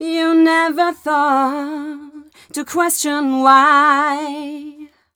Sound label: f mel d, fem Vocals